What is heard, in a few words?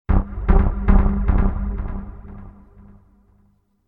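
orchestra creepy melody in space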